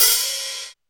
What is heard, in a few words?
hat open; Drums; Ableton Foundation FreePacks